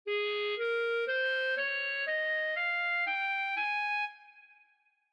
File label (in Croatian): B dur, ljestvice, clarinet